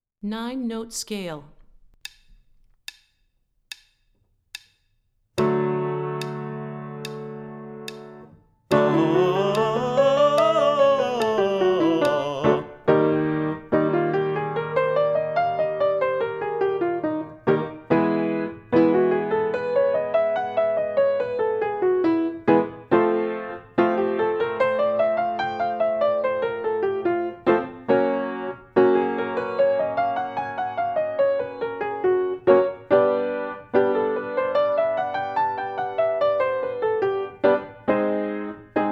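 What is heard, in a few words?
Nine Note Scale - High, Vocal Warm-ups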